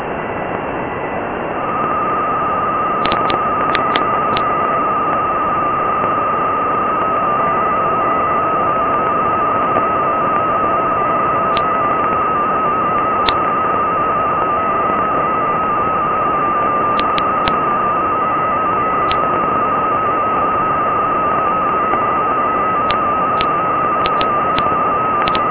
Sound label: VLF; SAQ Grimeton